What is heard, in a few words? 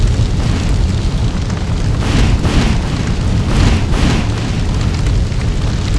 Fire Pole idle; Seer; GamigoNA